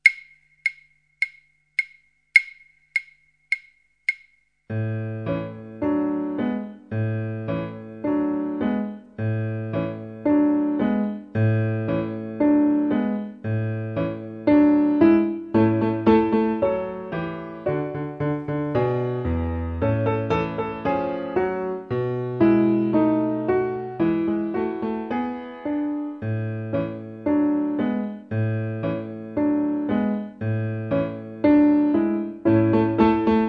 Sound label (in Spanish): piano